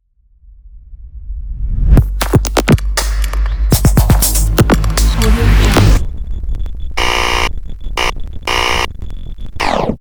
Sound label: dark glitch logo